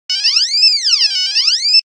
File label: Nokia Buzzer; Windows Phone apps